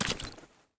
water footstep; metro

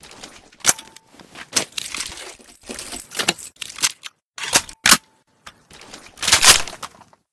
rpd reload, weapon

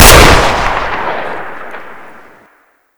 ashot shoot, weapon

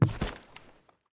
wood footstep

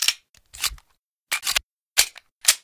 makarov unload, weapon

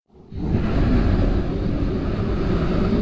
librarian Death

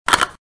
healing item pick up

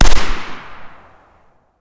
svds silencer, weapon; metrocraft

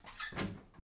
door Open; metrocraft

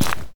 mud footstep

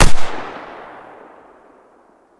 svu shoot, weapon; metrocraft

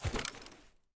grass footstep

